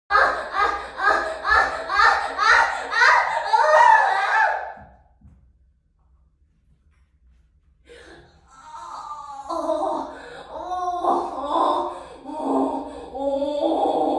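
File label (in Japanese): ガチオナ, ディルドオナニーで絶叫オホ声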